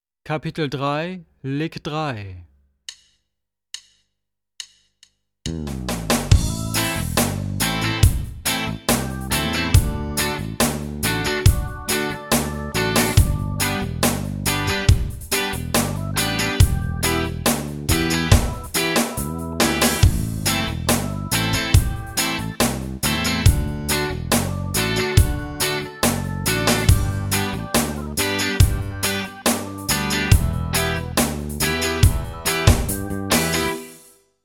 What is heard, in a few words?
Latin Style Guitar